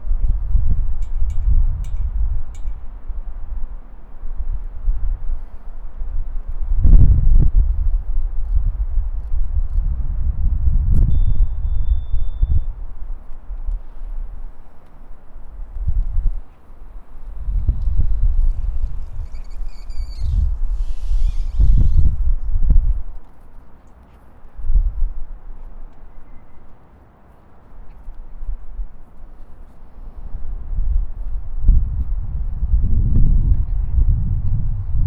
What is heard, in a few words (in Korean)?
바람